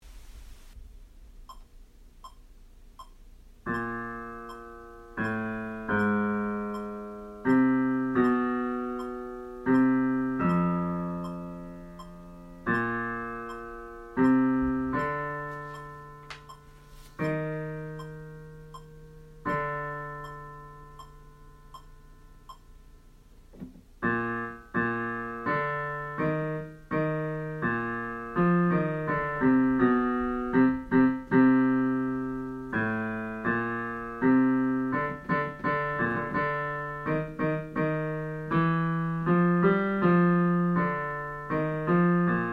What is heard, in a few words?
Bass